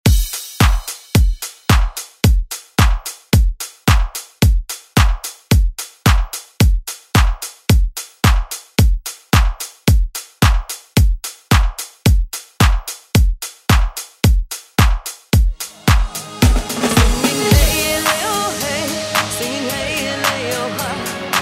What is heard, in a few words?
Indian Song ReDrum; DJ Mhark; Two In One